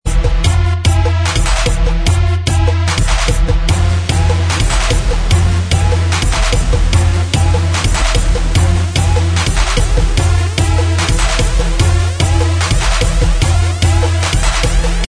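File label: Instrumental Track, backing tracks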